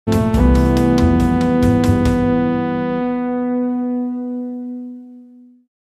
Arabian Rumba

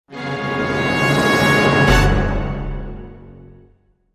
Fanfare Build & Finish

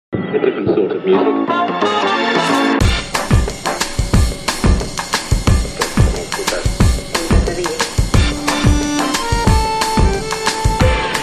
Tango

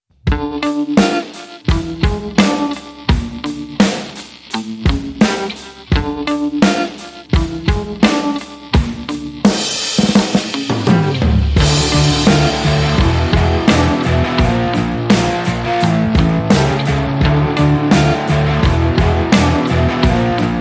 No Lead Guitar Mix

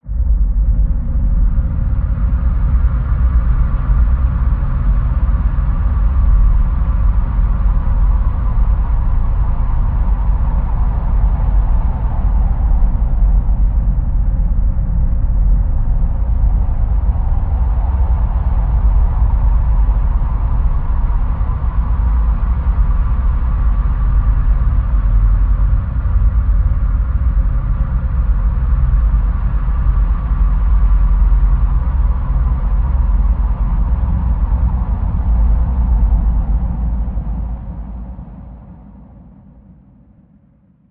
Planetary Low Drone

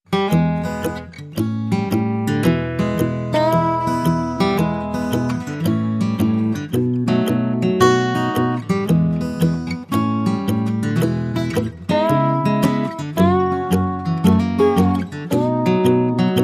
Lazy Birds, Reduced

